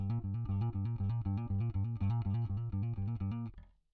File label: bass